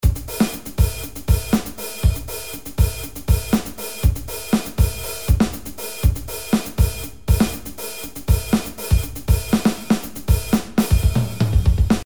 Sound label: 16 beat